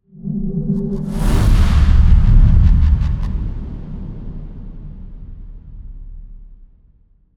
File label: Stingers; Zenith; SoundMorph; Bloody Nightmare; GDC Game Audio Bundle